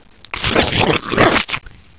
zombie idle

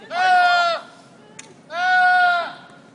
argh; svencoop; sank

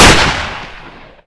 grease gun shoot, weapons; dod

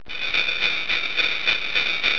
sleigh bells; creep xmasitall

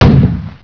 turret fire

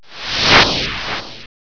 whoosh

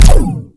plasma fire

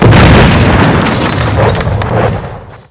explode

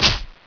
mushroom hit

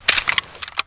draw, weapons

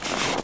snow, player

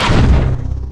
super phys launch

